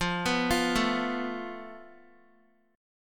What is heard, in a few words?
F Major Flat 5th, Guitar; arpeggiated; chordshape; x,8,11,10,9,x